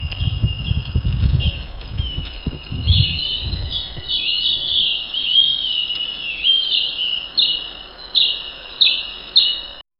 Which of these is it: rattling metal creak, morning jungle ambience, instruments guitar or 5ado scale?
morning jungle ambience